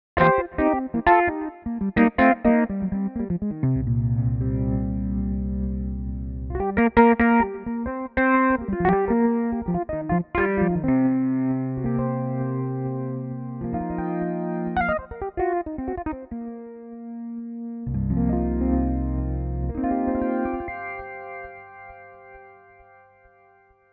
electric fx; fabfilter twin2

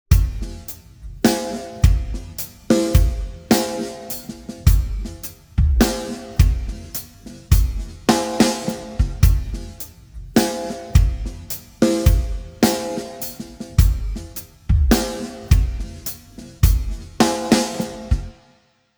drums, timestretch 200; izotope radius

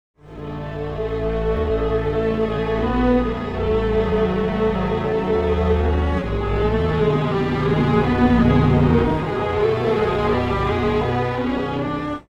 strings, timestretch

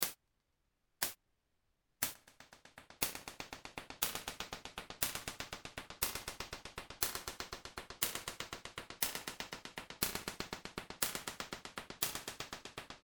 comb; native instruments absynth 4

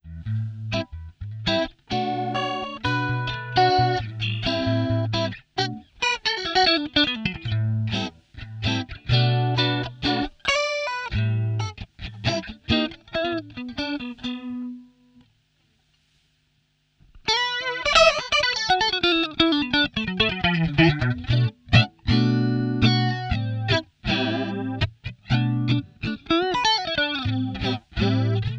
ultra flanger, pedales guitare; behringer pedales guitare

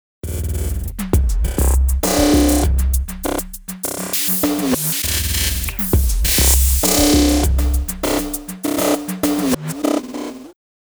drummers; sugar bytes; effectrix

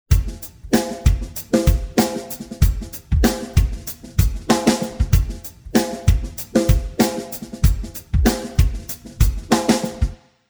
drums, timestretch, 110; serato pitch n time pro

